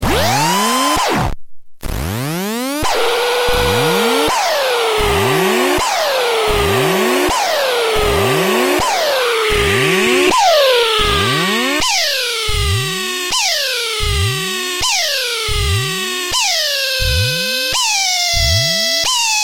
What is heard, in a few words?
Propeller Lead, HardSID